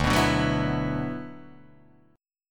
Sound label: D 6th Add 9th D, Guitar, Open D